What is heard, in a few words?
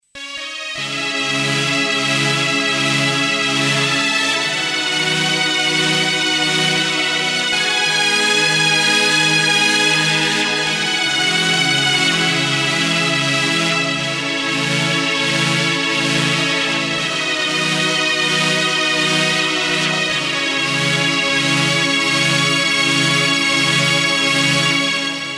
saw